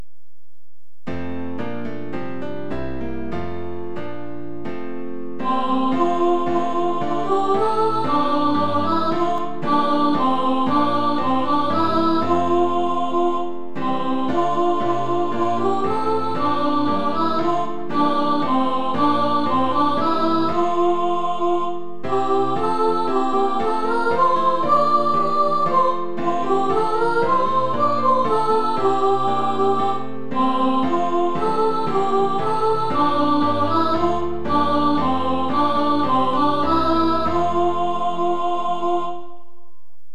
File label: soprano, choir